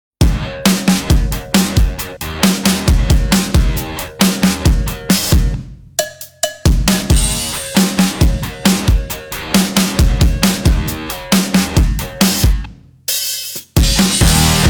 Skate, Nokia Ringtones; Nokia 208; Southeast Asia

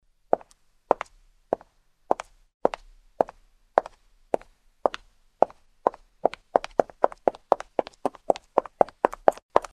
Step

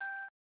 One Min Beep; LV7500 Whistle; LG

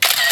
camera; ZTE Nubia Z5S Mini; ui

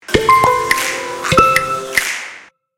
mnemonic, ringtones; WindCanada

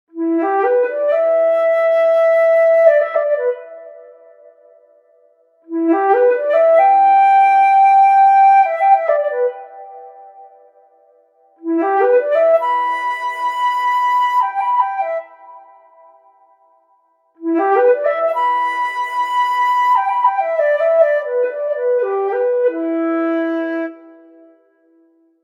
Ring Organic Fluto; Alcatel OT-3088X; ringtones

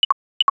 battery low; Samsung; GT-B3210 Corby TXT; System Sounds

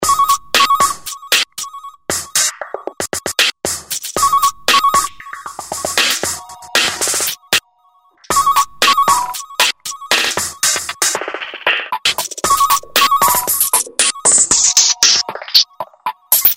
Popple Tone, ringtones; Samsung; Galaxy S SGH-i896

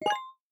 biometric register complete; Rollable LM R910N; ui; LG

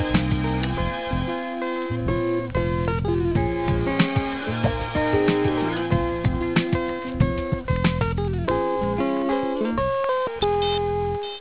Incoming Call Melody; Siemens CX65V SFR

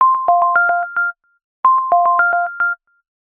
Skipping Rocks, ringtones; Samsung; Galaxy Watch 8

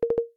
Cache Clear; IUNI U2

